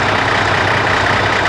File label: Motor Diesel Engine; Working Model Program